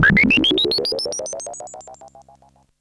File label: FX